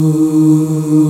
VOX D#2, Vox Percplex'd

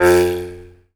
jaw harp, Pitched Percussn, Jaw Harps

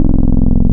VV Sallen Key PWM, wavetables; Animoog

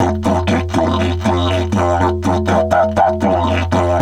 120, Didgeridoo

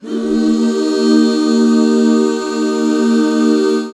Eb 6, back chords; Sound & Vision - Gigapack II CD 1

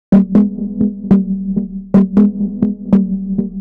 dirty harps Ab 132, synth loop; Synth Loops; Zero-G; Soundclash Nu Dance Electro; Acidized; 132bpm

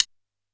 G#1 Sticks, Yamaha PSR-E323 Standard Kit

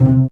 STRING PIZZA; SONIC ELEMNT; SONIC EL YES

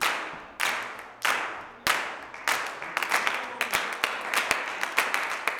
CLAPS 96; Spectrasonics; Vocal Planet; Blues Soul; G. CLAPS

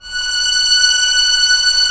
STR F#4 PP; Sound On Sound; Peter Siedleczek's Orchestra

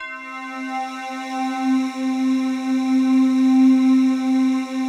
PAD, DIGI-PADS

